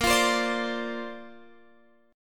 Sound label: A sharp Major Add 9th, Guitar Open F; A#add9; strummed; x,17,17,19,17,19